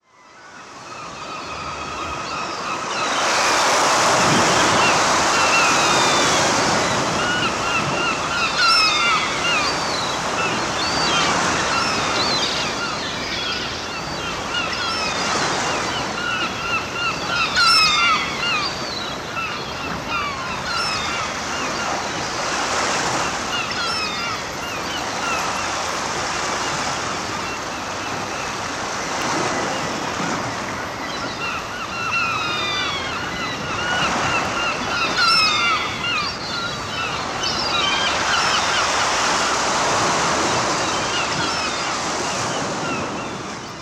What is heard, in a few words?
Seagulls